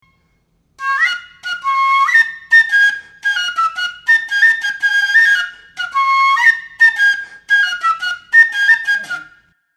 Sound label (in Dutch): pijperfluit